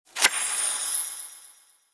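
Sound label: Digital Card; Yu-Gi-Oh! Master Duel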